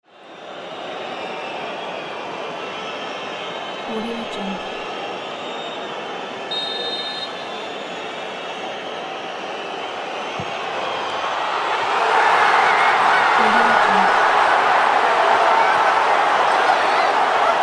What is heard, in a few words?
football goal